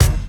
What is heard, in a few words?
dry bass drum sound f key; Duration 0:01; Channels Mono